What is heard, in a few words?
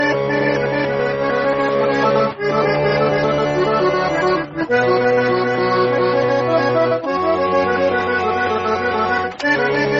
accordion